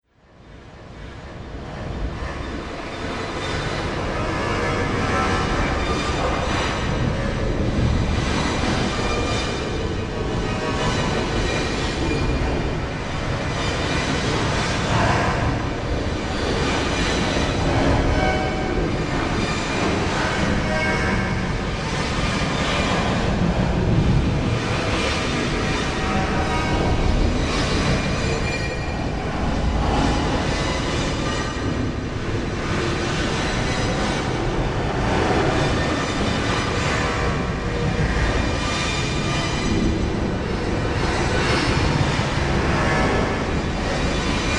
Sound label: Soundscape Rocket Production; Science Fiction Sound Effects